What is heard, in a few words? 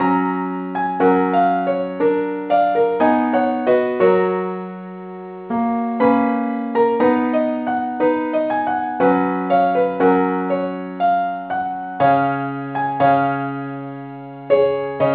keyboard Only